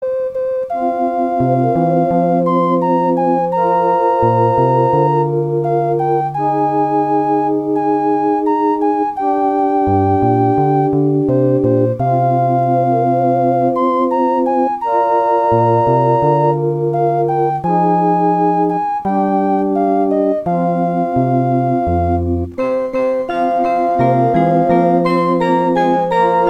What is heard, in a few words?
ocarina; dragon ball z tapion